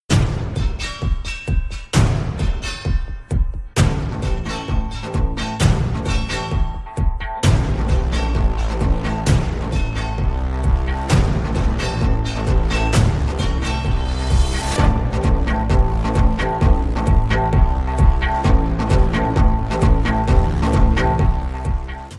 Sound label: Instrumental Ringtone; Mirzapur